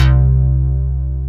Dynamic Bass; Best Service Gigapack 2

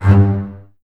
Basses G 2, String Ensembles; Miroslav Vitous; LINKS ORCH

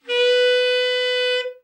tenor soft, sax; Giga Samples Collection

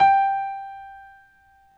PNO XYPNO, Grand Pno, Roland; Club-50 - Foundations; Grand Pno 2

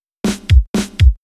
Fill, Drums, Eclectic Electronica

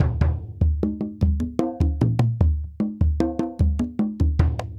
African Drum 75 bpm; African Drums; Quazibeat; African Secret

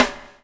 BUBL, Backbeat; Spectrasonics - Backbeat

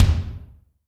kick, Zoom MRT-3B

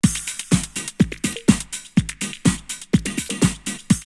On The Beat 124, drumloops Rave; X-Static Goldmine; 90 s Sample CDs

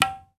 TABLA; Hip Hop; E-MU Formula 4000 Series Vol. 1; Hip Hop Nation

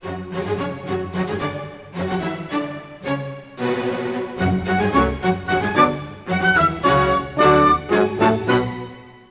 110 f# min full mix, Orchestral arrangements; PowerFX - Downtown Orchestra

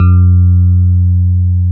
KEY EP2 E 1, Roland, E.Pianos, Digital EP's

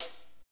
HAT, Drumscapes Roland; Northstar; Fast Shuff

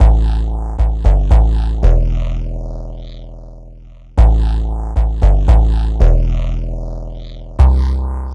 Bassline; Basslines; Drums & Drones

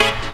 HIT, Hits & Brass

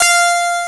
HARP HI DEC; WORS STATION; BODY BEAT

